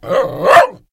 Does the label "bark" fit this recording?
Yes